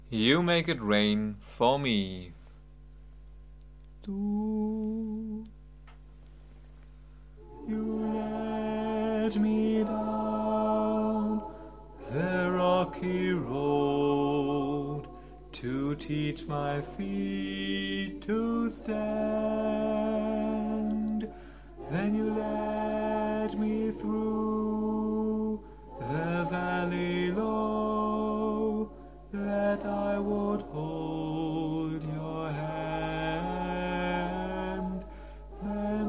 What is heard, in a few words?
Tenor